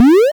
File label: synth yes, machines